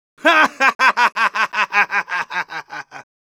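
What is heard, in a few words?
Medic laugh long, Spanish; Risa larga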